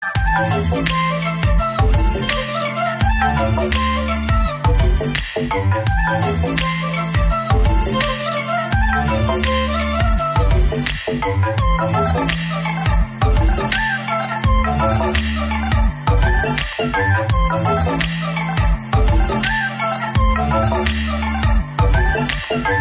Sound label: Music Ringtone; ABCD 2019